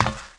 Foot Grate; Player; Footsteps

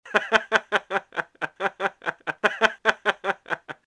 haha, cantonese, male; Soldier Of Fortune II Double Helix; XBOX; enemy

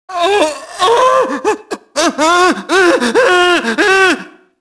junkie noise; enemy; Max Payne

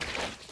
footstep slide water

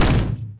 crane Arm hit body; impacts explos

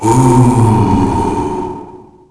Tiki Chant; Finding Nemo; XBOX

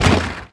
bouncy plastic hit; bouncy objects; Tony Hawk's Project 8; XBOX

